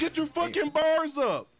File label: rage